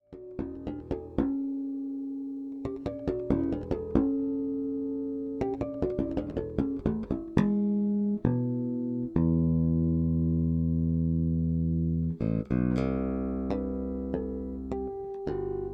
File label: Fender Precision Bass Jazz Pickup aktiv Low cut High boost; Troy Sanders